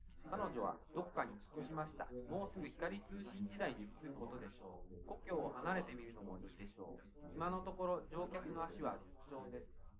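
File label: male, live recording 250ms 5cm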